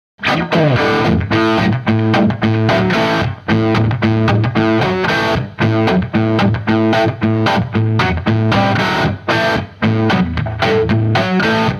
Gibson Les Paul Marshall 2204 Koch VG1290 Shure SM57